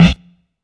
low tone snare sound a sharp key; snare drum samples

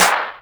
raw clap sound G# key; percussion; single hits one shots

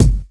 crispy steel kick drum sound f sharp key

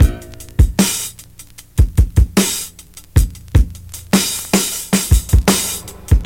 77 bpm breakbeat e key

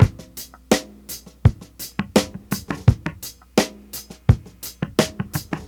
84 bpm fresh breakbeat sample g sharp key; drum loops

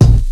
boomy kick a key; kick drum samples

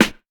sharp snare single hit A key

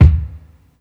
bassy kick drum single shot d sharp key

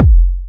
kick drum single shot f key

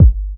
warm sounding bass drum sound g sharp key

